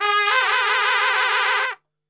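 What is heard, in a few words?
G#1 trumpet shake, Brass; Brass Super Section; TRP SHAKE; Best Service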